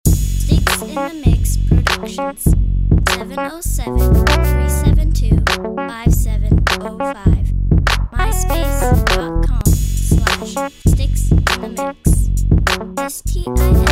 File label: guitar up tempo